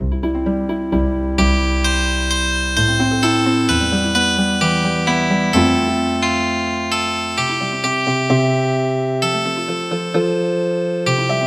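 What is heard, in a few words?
Healing Harp; Ayelevi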